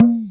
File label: talking drum